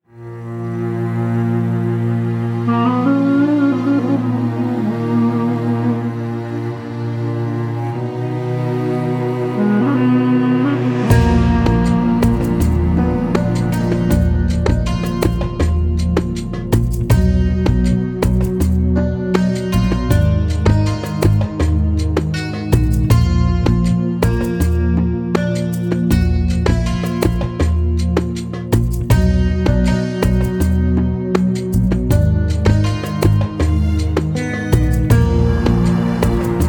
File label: egyptian egypt desert music